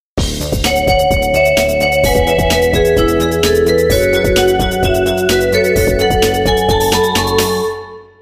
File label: bell